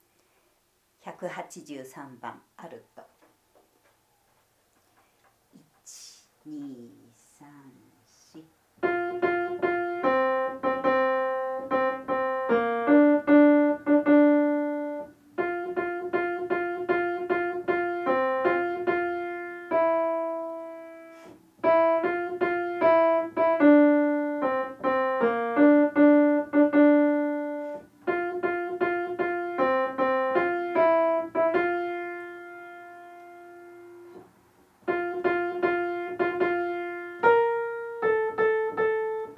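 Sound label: alto; キリスト集会合唱団・合奏団; 賛美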